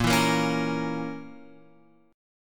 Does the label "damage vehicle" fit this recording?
No